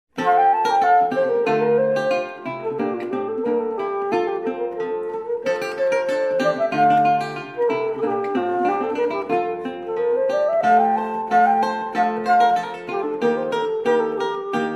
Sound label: lute flute duet